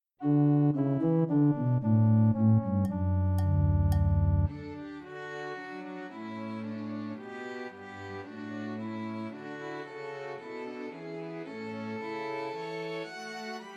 Christmas Trios for Two Violins and Cello; While Shepherds Watched

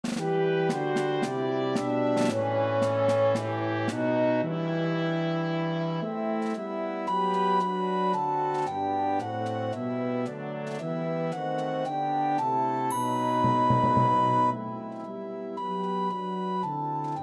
Recorder Track with Melody; God Rest Ye

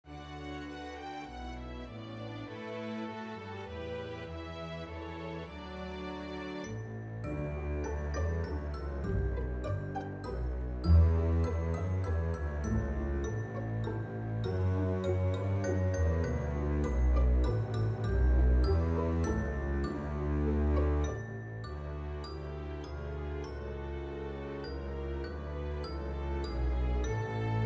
Double Bass Track with Melody; We Wish You A Merry Christmas

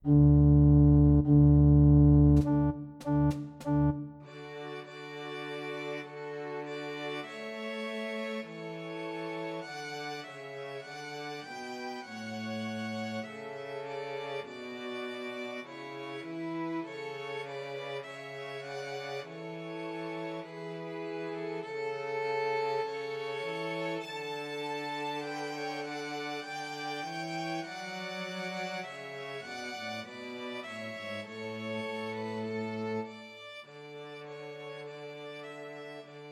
Christmas Trios for Two Violins and Cello; O Come All Ye Faithful

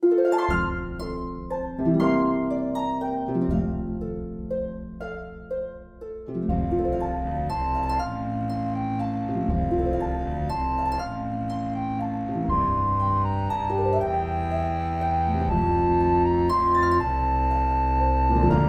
track with Melody; Silent Night

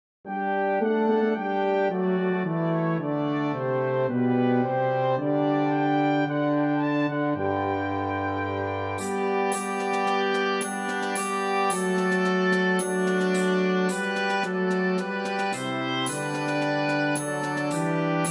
Oboe track with Melody; O Come All Ye Faithful

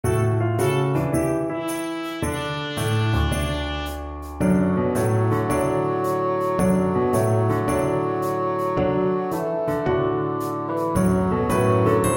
Mini Bassoon Track with Melody; Jingle Bells

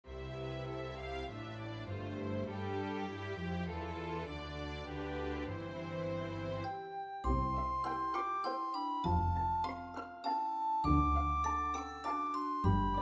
Recorder Track with Melody; We Wish You A Merry Christmas

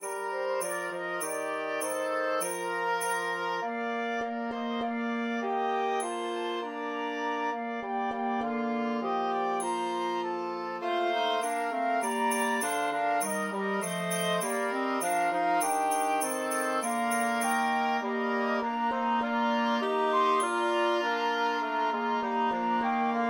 Clarinet Track with Melody; The Holly and the Ivy

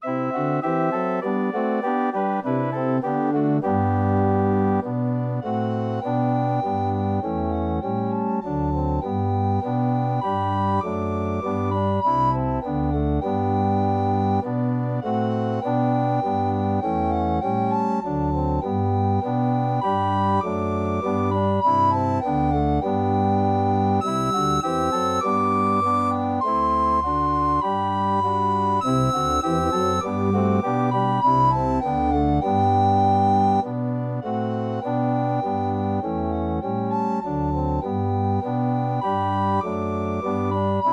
Recorder Track with Melody

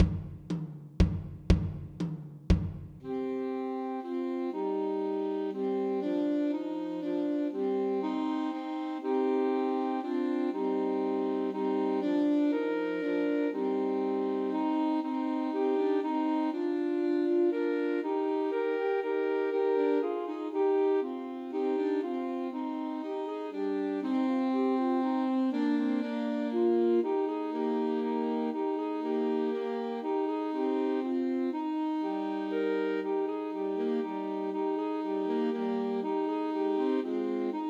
Trios for Three Alto Saxophones; We Three Kings; Christmas

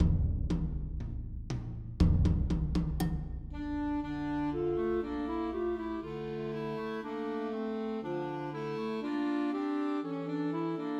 Trios for Two Clarinets and Alto Saxophone; God Rest Ye Merry Gentlemen; Christmas

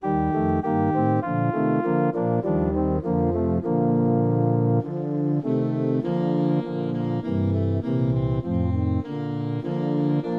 Saxophone Track with Melody

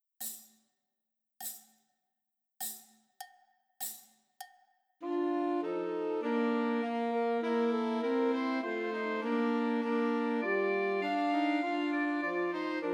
Two Altos and Tenor Saxophone; Christmas Trios

